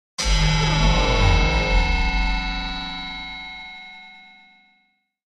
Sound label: Stab Metallic Clang